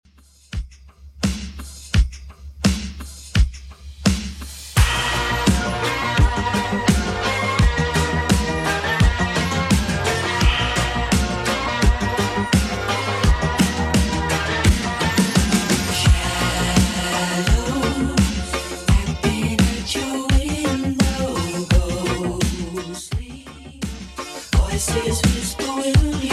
80s 88 bpm

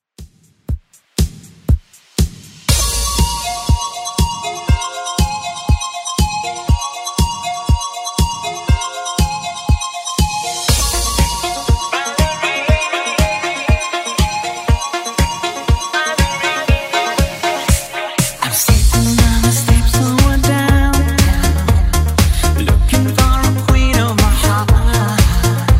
80s Redrum 120bpm

